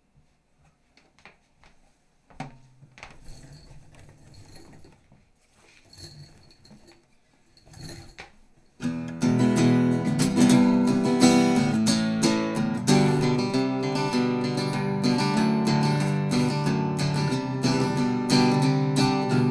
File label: test guitar, Electret Microphone